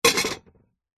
household small sized metal stockpot cooking pot empty set down on hard surface; zapsplat